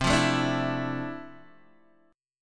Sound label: F Major 13th C, guitar chord